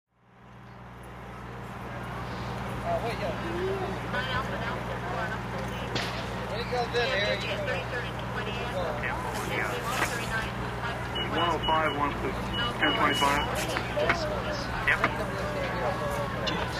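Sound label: police radio siren and police talk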